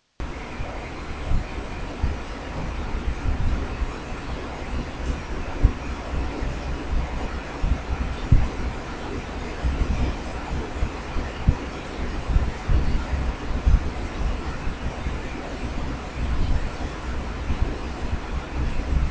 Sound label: pluie tropicale tropical rain